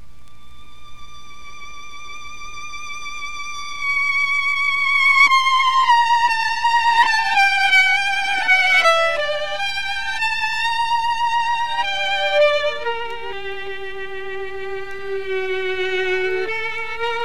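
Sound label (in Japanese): Stradivarius, 擦弦; Joachim; 弦鳴楽器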